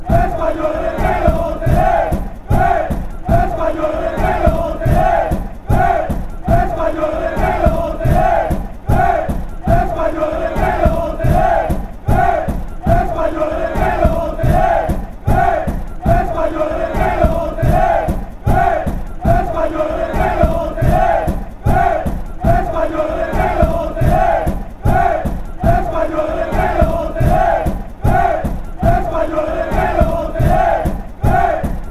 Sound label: spanish, fan chants